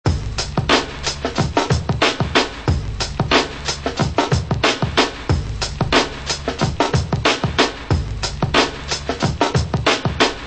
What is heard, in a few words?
drum loops